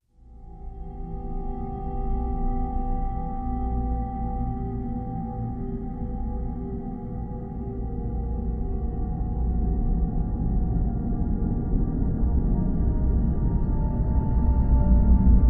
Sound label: Secret Room Ambience